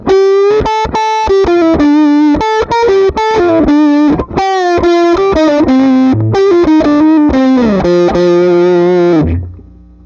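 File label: No Pedal, guitar; Guild 1984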